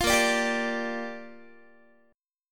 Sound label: A sharp 6th, Guitar; A#6; strummed